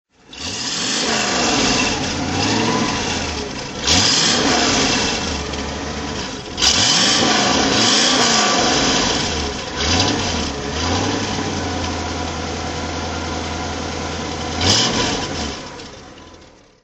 corvette stingray 1963 coupe

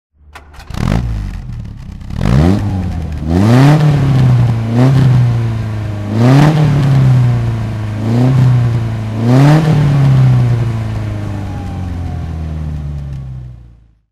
lotus elan sprint coupe throttle; Lotus Elan 'Sprint' coupe, 1971; Engine sound; V3D/ ClassicarImages; Marc Vorgers

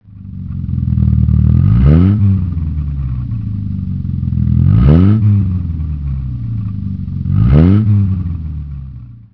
facel

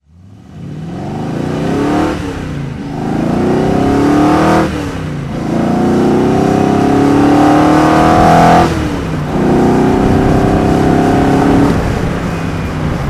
morgan+8 driving, Engine sound driving; Morgan +8, 1987; musgrove; V3D/ ClassicarImages; Marc Vorgers

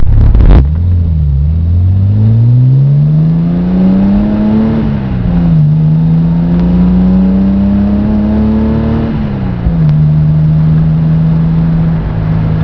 TR6 tuned int, classic car

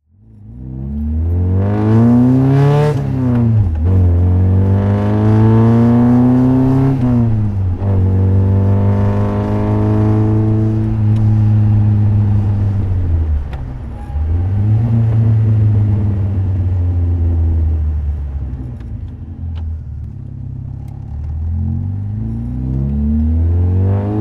morgan 44 ford sportexh driving; Morgan 4/4 1800, 1997; Engine sound driving; V3D/ ClassicarImages; Marc Vorgers

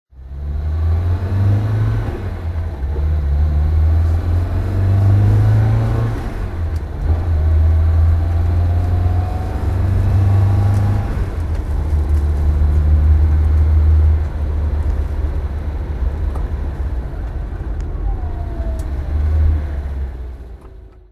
volvo 133gt driving, engine sound driving; Volvo 133 GT, 1968; V3D/ ClassicarImages; Marc Vorgers

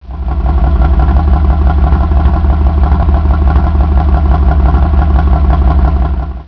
alvis sp20 idle, Alvis Speed 20 SB 1934; Enginesound (idle); V3D/ ClassicarImages

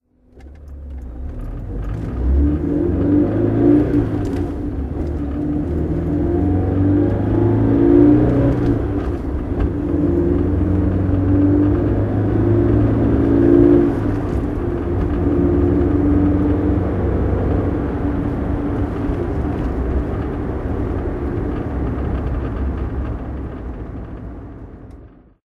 porsche 924 driving

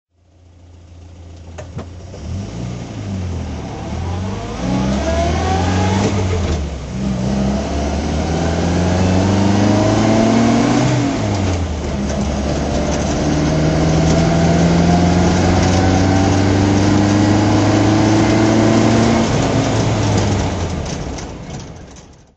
healey sprite frog drive

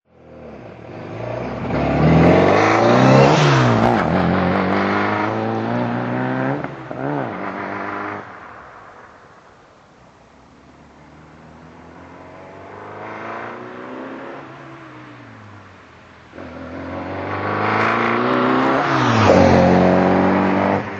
austin healey sprite mk2 drive by

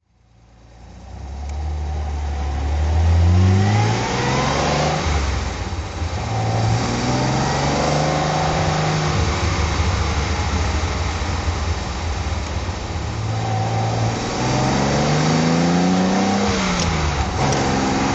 healey 100 6 3 weber drive

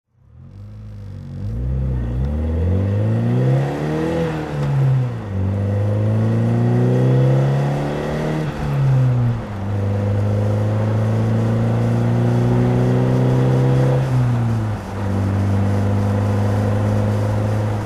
morgan 4/4 ford zetec drive; Morgan 4/4, 1995; Engine sound driving; V3D/ ClassicarImages; Marc Vorgers

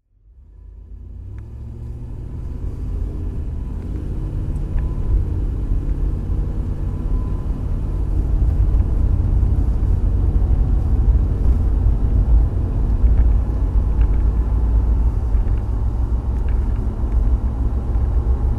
range rover classic driving

